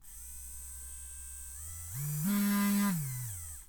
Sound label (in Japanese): 電マ音